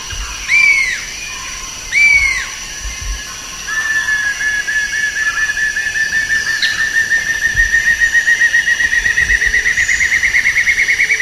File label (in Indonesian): sempur hujan darat